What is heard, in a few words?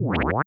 inchworm locomotion